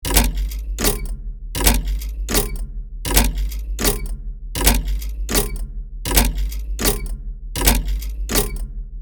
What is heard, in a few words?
Peculier clock massive ticking loop; steampunk; gfxsounds